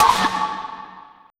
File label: Trap Oneshot; Sonic Foundry (Sony Creative Software); Esoterik Beatz